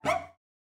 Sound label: Cello Psycho Screech; SoundsCrate